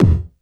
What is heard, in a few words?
bass drum, Drum & Bass